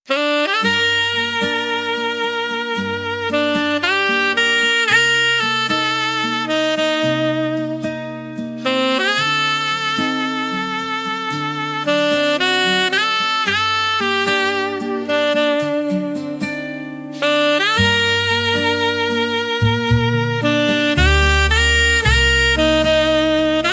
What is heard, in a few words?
midnight sax